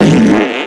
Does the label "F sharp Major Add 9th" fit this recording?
No